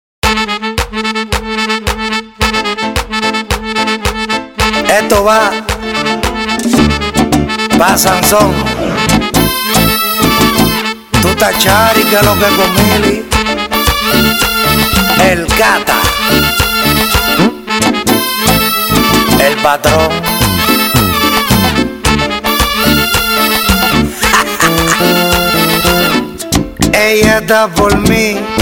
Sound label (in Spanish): merengue de calle